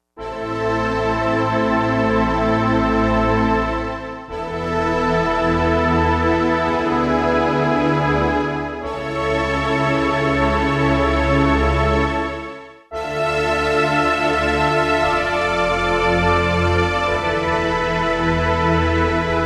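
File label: Orchestra Choir; Digital Synthesizer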